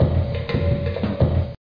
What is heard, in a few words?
transition; KidPak; Jack's Jungle Game